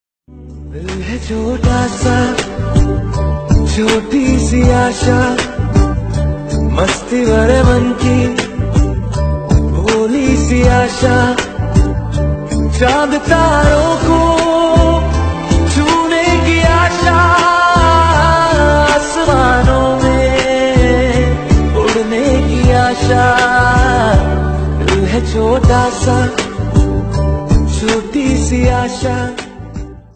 Hindi Song Ringtone

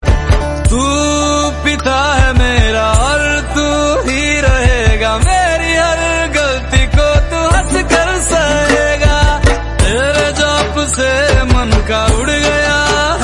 Bhakti Song

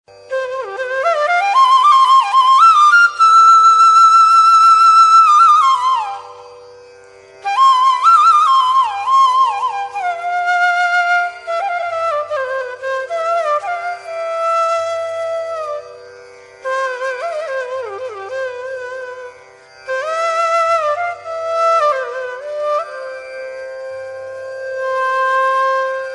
Indian Classical Flute Ringtone; ringtones

